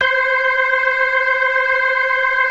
DRAWBAR VIB, Synth and Organ

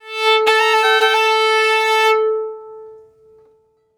Arabic Violin; Sonic Foundry (Sony Creative Software); Continental Drift Aftershocks

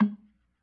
G#1 PERC, B3

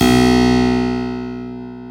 KEY HRPCR, Roland; Roland - LA Composer vol. 1

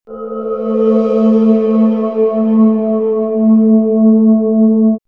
Swirls Bell Star, pads; X-Static Goldmine

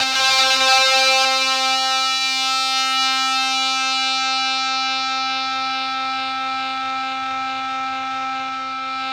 ZWEEP, Synthy Tonal; Distored Reality

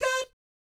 DAT C5, Jazz FX; Spectrasonics; Vocal Planet; JAZZ COMBO

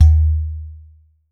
udu; Voice Crystal - Steve Reid Definitive Percussion

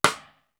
BD AND CLICK; East West; Global Sounds

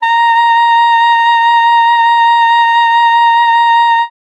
sax a#5, Saxophone mezzoforte; Session Instruments

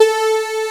LD A3, SYNTHESIZER LEAD

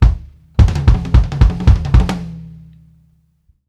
MORE TOMS, drumworks fills; Sampleheads - New York City Drumworks VOL-1

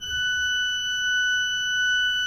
F5, Cathedral Organ; ILIO; Propeller Islands Cathedral Organ

